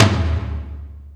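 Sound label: XLo Cannon, Alesis D4 drum machine tom; 300 Drum Machines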